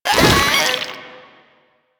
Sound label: Proto Infested Runner Jump Whoosh; Warframe; Abilities